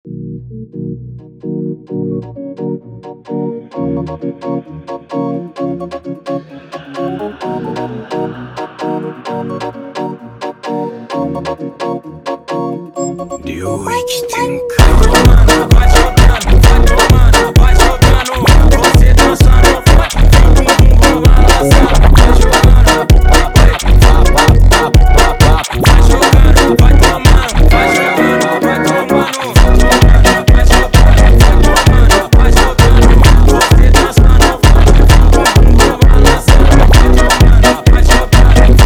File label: Funk